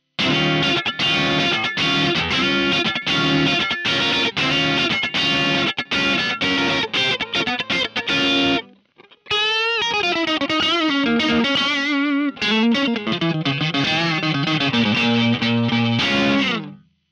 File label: Crunch